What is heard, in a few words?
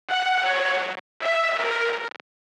TRIP HOP DISTORTED STRINGS